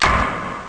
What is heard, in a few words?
SWITCH